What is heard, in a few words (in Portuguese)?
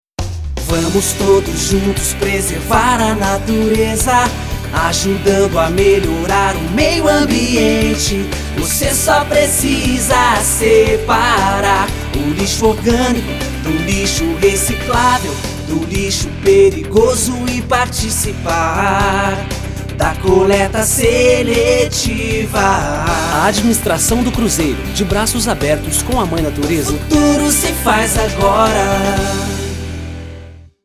Jingle Coleta Seletiva; acolhimento